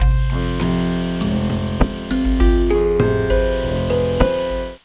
bruit; MOBICLIC37

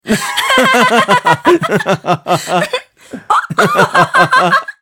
rires; MOBICLIC136

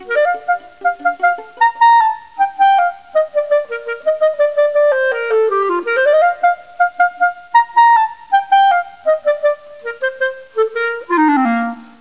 haubois; MOBICLIC 14; europe

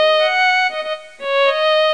violon; MOBICLIC 14

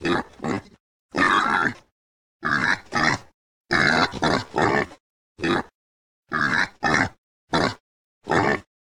Cochon loop; chaos league

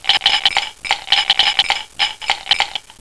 crayon; MOBICLIC; langues

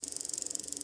ascenseur; Mayas

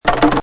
bruit plaques; MOBICLIC 69

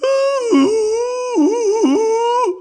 bruit; MOBICLIC41

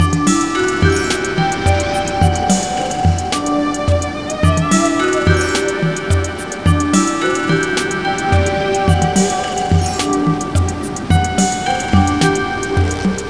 Froid; MOBICLIC 14; europe

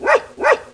chien; MOBICLIC 47; LANGUES

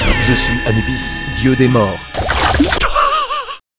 bruit; MOBICLIC37

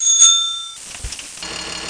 velo

